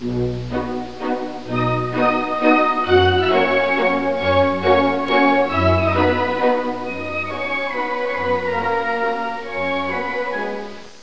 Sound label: FLY DANC